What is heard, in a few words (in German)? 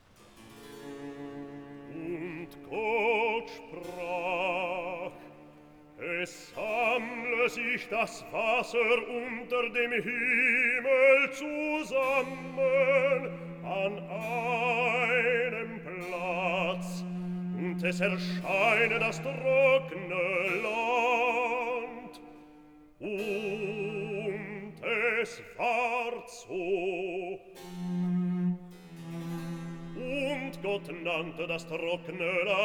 Rezitativ; Und Gott sprach: Es sammle sich das Wasser; Haydn; Die Schöpfung